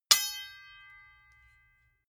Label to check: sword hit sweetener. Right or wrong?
Right